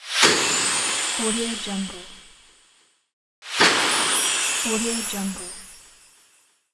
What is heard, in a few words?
magic poof